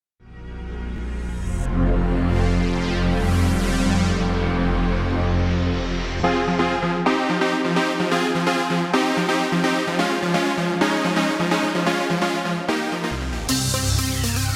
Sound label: Modern Classic SpaceSynth